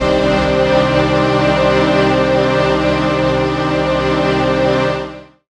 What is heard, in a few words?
Pad Mood; Jungle Warfare 1&2